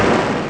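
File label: explosion; Amiga Soundtracker Sample Packs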